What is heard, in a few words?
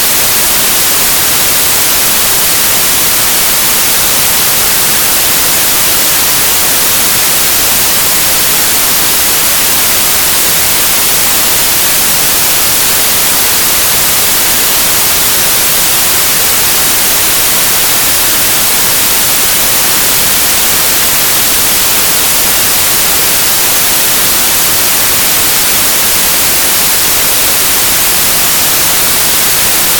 white noise